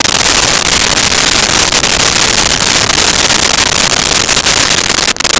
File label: Sea Shore; Nintendo; July 2020 Gigaleak